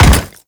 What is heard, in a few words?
Step Default; Warframe; Grineer Leto